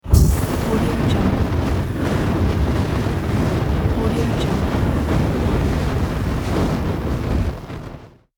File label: flame thrower